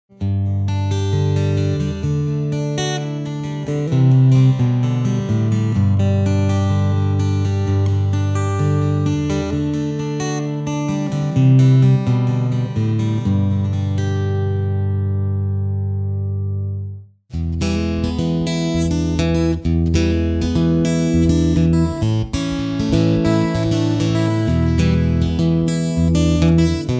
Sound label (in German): Thorndal Nemesis EA mit POD X3 Presets